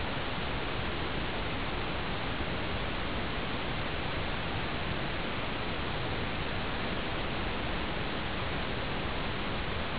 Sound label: pink, ピンクノイズ 20Hz〜20KHz ｰ20dB